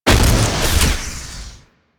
Flak Cannon Alt Fire, weapons; Warframe; UT Flak Cannon

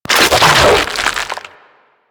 Ghoul Devourer Hook Tongue Projectile

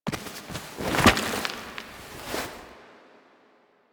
Operator Fall Off Cliff Foley; The War Within

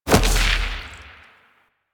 Edge Kick; Warframe; Pacifist

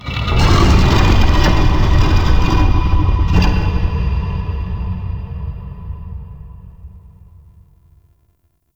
Twisted Stone; Warframe; Man In The Wall

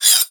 Whip Mech Rotate; Grineer

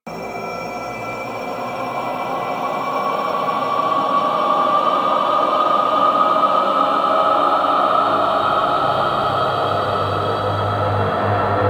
Relay Reconstruction Dark Loop, Ambience; Warframe; Hub

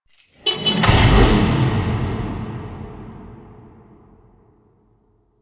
Motorcycle Ignition; Hub; Warframe; Nineteen Ninety Nine; Quests

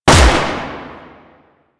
Gotva Prime Fire, weapons; Warframe

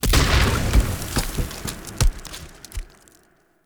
Tree Dead Destroy; Objects; Destructible Trees

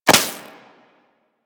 Laser Impact Default; Warframe; Weapons

